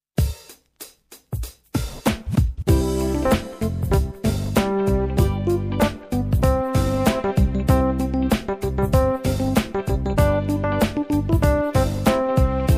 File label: rap instr r and b; Musikschule Bernd Baumann Lübeck - Stockelsdorf - Bad Schwartau